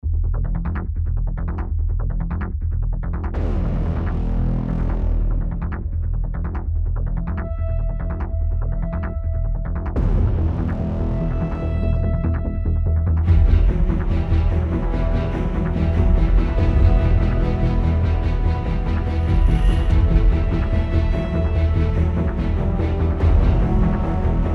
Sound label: Modern Hybrid Action